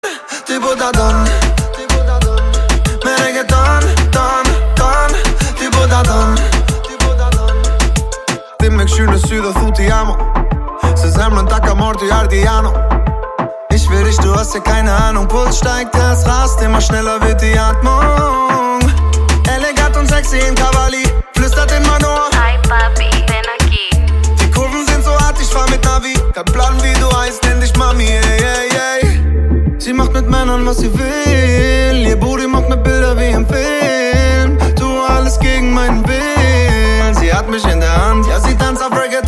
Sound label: reggaeton